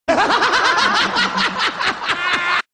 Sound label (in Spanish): risa